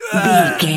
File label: voice male grunt pain